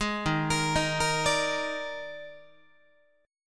Eb Major 7th Suspended 4th Eb, Guitar; arpeggiated; 11,11,13,13,14,13; EbM7sus4

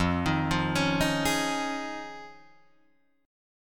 F Minor 7th Sharp 5th Guitar Drop D, chord

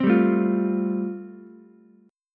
A Minor 7th Sharp 5th A, Ukulele; Am7#5 strummed; 5,7,5,8

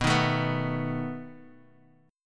A Suspended 2nd Flat 5th B, guitar

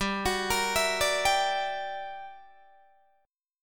G Minor Major 13th, G, Guitar, DADGAD; GmM13; arpeggiated; 17,21,20,21,17,17

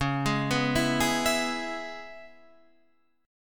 C sharp Minor 7th C sharp, chord

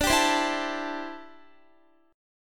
D 7th Suspended 2nd Suspended 4th D, Guitar; D7sus2sus4; strummed; x,17,17,17,16,16

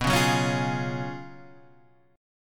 F sharp Major 7th Suspended 2nd Suspended 4th, B

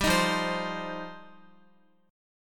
F 7th Suspended 2nd F down Guitar

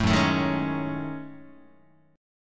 F sharp Minor 6th Add 9th, Guitar; strummed; JGuitar

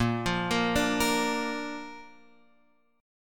Bb Major Bb down Guitar Open F; arpeggiated; JGuitar

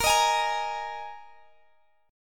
Bb 7th Suspended 4th Bb Guitar Drop D; strummed

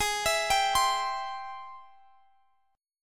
Ab Augmented Major 7th Ab Guitar Standard; arpeggiated; x,x,18,21,20,20; Ab+M7; JGuitar

